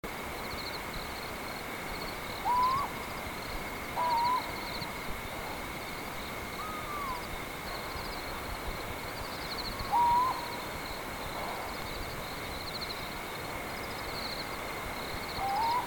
Otus megalotis, Filippijnse dwergooruil; uilen; Strigidae

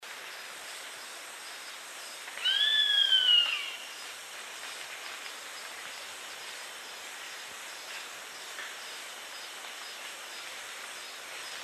Buteo albonotatus, Bandstaartbuizerd; roofvogels; Accipitrinae; Accipitres; Accipitidae

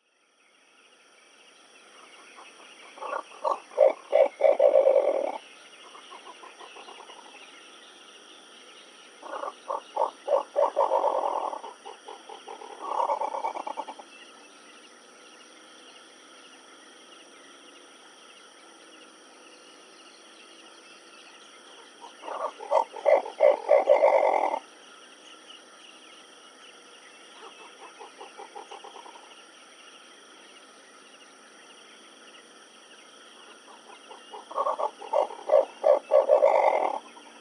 Bubo coromandus, Coromandeloehoe; uilen; Strigidae

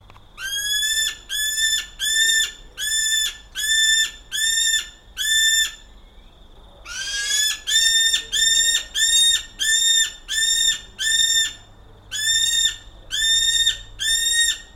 Falco novaeseelandiae, Nieuwzeelandse valk; roofvogels; Falconidae; Falconae

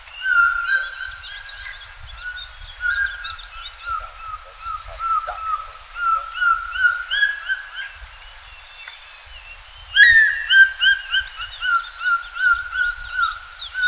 Nisaetus lanceolatus; roofvogels; Accipitrinae; Celebes Kuifarend; Accipitres; Accipitidae

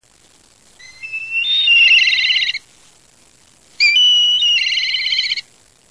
Milvus migrans, Zwartewouw; roofvogels; Accipitrinae; Accipitres; Accipitidae

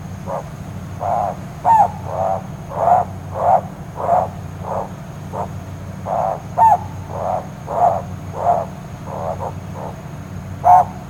Otus mantananensis, Mantanani dwergooruil; uilen; Strigidae

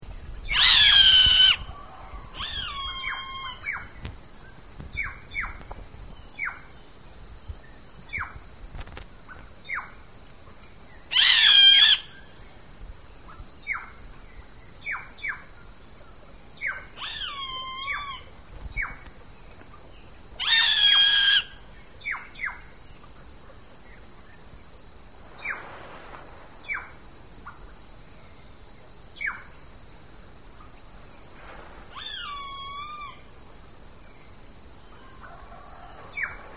Buteo magnirostris; Wegbuizerd; roofvogels; Accipitrinae; Accipitres; Accipitidae

